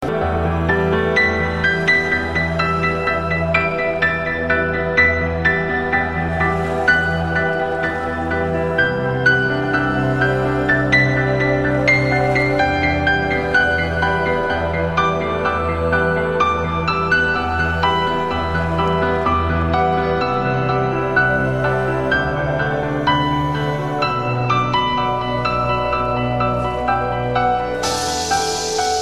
Relaxing Piano Tone

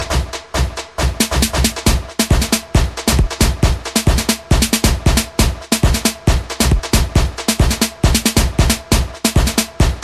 Caribbean Drums

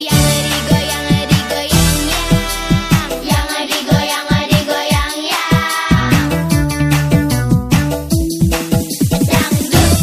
Hello dangdut

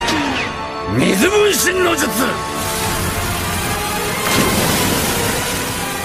Water

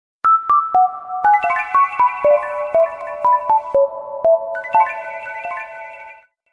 Message Alert

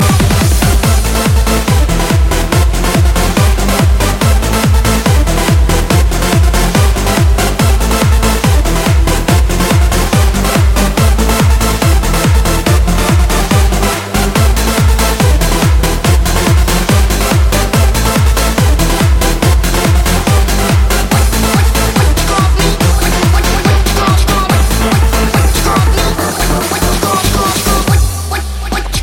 Funky

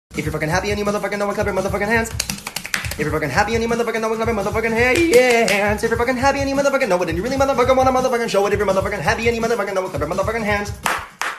Clap Your Hands

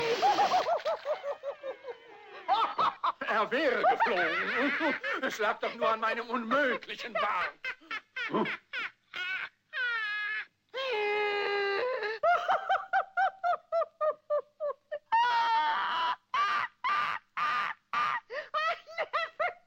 Laughing

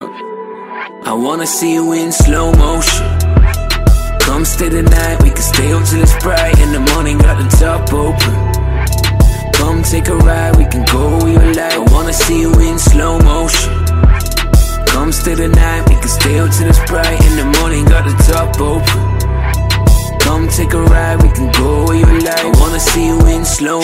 Slow Motion

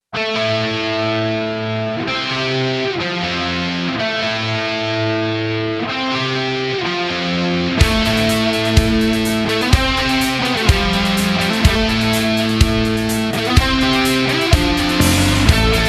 Guitar Tune

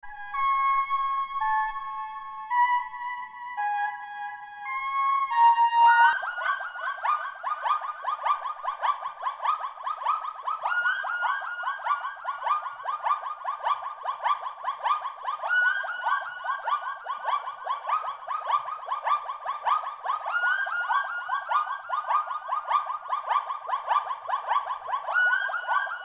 synthetic

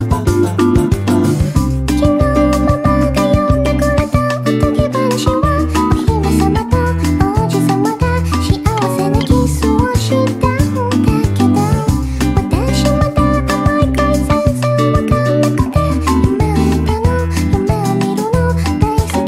Bossa nova

